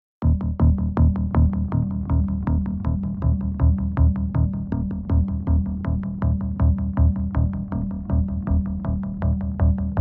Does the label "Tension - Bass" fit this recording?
Yes